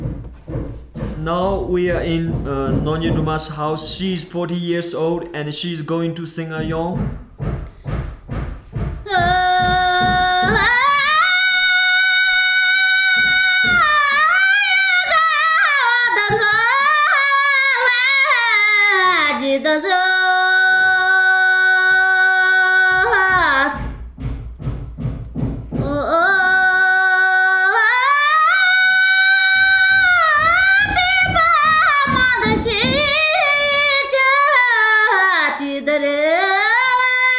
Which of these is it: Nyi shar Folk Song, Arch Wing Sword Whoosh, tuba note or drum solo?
Nyi shar Folk Song